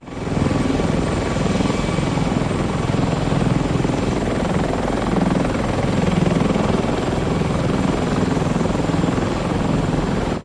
CH-53 Super Stallion helicopter idles on tarmac. Choppers, Aircraft Engine, Helicopter